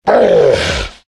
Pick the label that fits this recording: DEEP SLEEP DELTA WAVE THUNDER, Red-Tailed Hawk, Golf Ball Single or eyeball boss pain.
eyeball boss pain